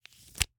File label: Slap bracelet, smack, slap, whip, felt, plastic, unravel, slip; Eiravaein Works; Vaeyan IV